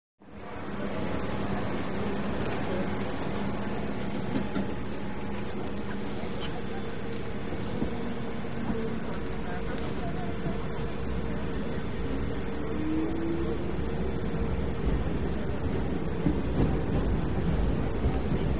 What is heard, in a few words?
Interior Modern Subw, subway